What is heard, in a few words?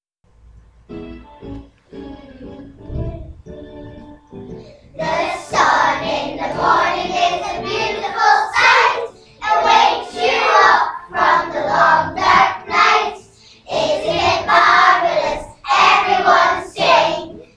Senior infants singing